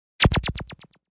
Lazer Sound; Laser Sound

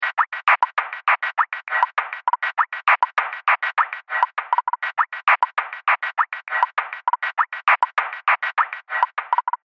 100 Bpm Lo-Fi Beats Loop; Drum Loops; Percussive And Rhythmic; Seamless loop; Andrew Fleming

